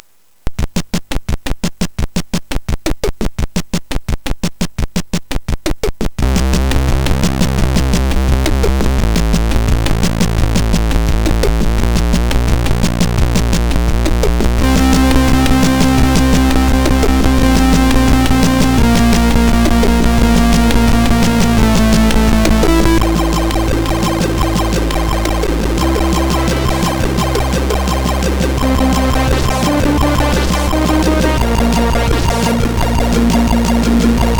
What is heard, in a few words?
sid, MOS6581R4